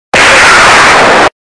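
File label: loud sound "baaam"; gromkii zvuk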